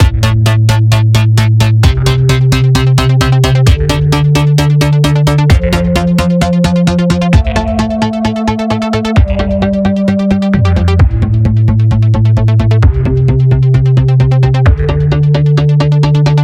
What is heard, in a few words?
Loop Ambiant